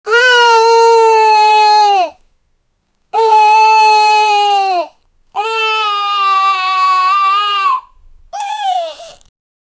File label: boy crying sounds